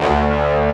Horns

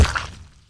splat

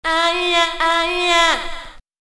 "iee-yeee-yaa", voice; ooh ahh

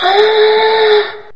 female orgasm

